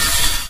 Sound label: cart rolling back grind; Source Engine; tf